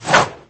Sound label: Tissue Throw; bubble-guppies; bubble-scrubbies